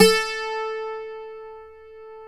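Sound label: Bouzouki A3 left; AKAI S6000 CD-ROM - WorldSounds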